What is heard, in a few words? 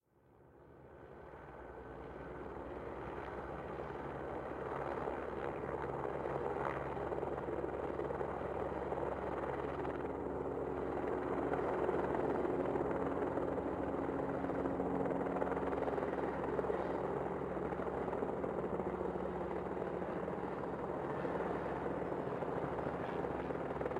Helicopter Flying